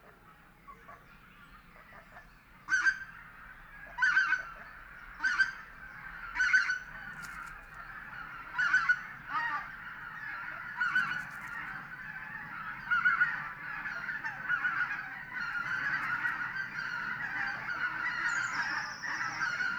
tundrahanhi

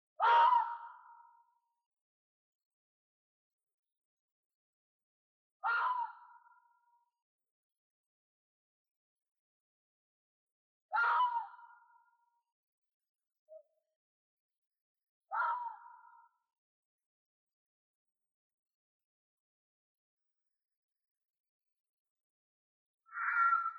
kettu

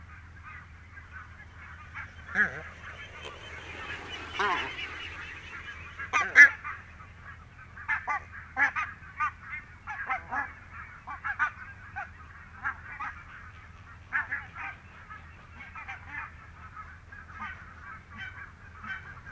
valkoposkihanhi

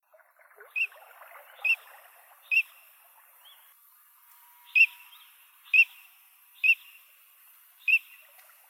tavi

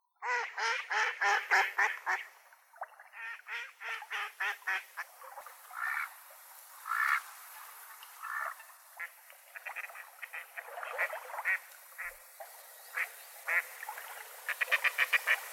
sinisorsa

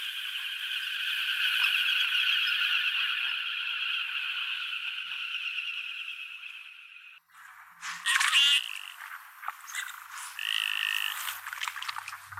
telkka

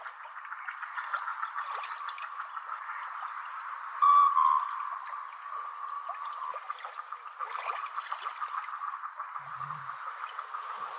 isokoskelo

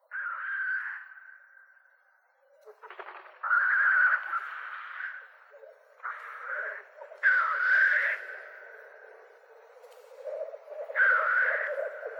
teeri

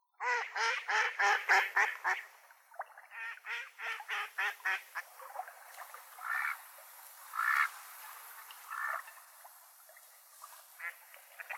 sinisorsa

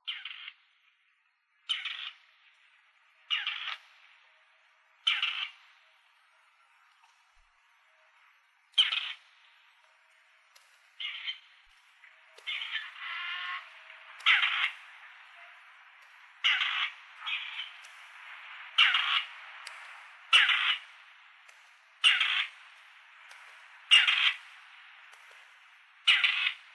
peltopyy

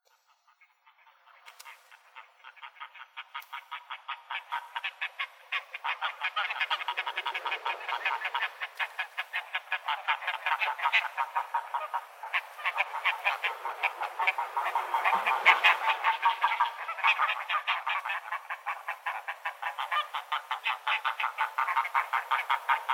merihanhi

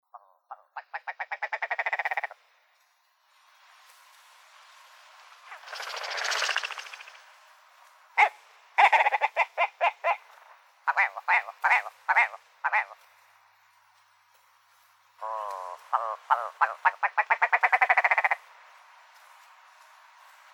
riekko

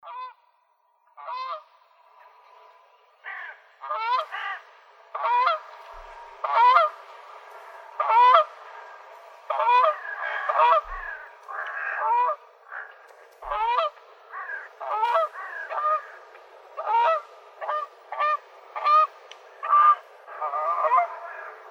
kanadanhanhi

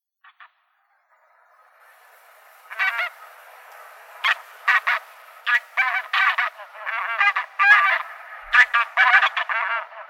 metsahanhi

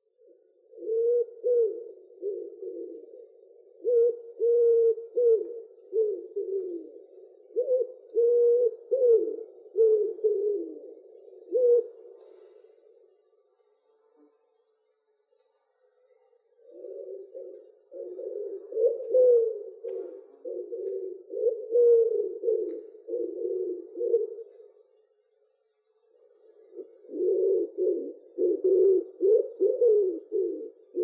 sepelkyyhky

